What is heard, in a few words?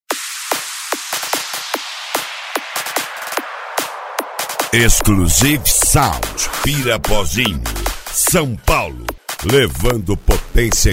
psy trance; cd exclusive sound vol 02